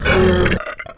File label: die; unreal; skaarj